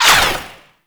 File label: Ranged Weapon Whizzby; Warframe; Necramech; Weapons